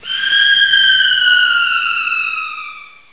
SCREAM

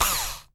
ELECTRIC DRIVER; AKAI; 1000 Sound Effects

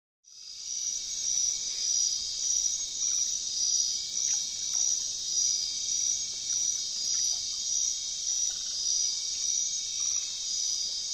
Jungle; Rosegarden

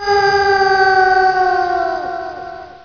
AAH

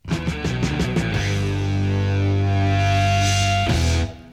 topformat maxi jingle single

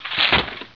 CRUNCH

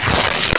RIP; Zoemtonen hisses pingen woesjes tikjes

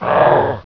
GRUNT

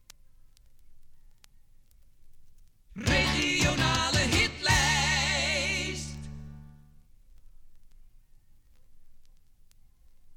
topformat maxi jingle single; topformat maxi jingle single4

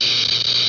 welding torch

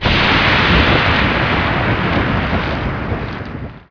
geyser vent

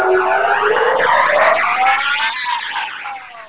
turn on; Zoemtonen hisses pingen woesjes tikjes

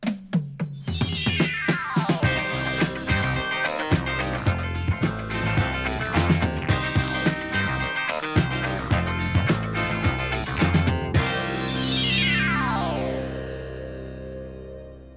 topformat maxi jingle single

